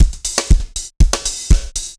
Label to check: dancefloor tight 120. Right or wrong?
Right